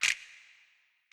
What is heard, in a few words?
SNAP